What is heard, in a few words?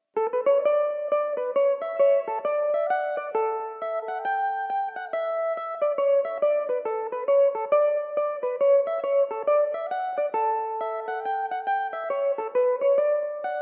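D major belmuse, irish mandolin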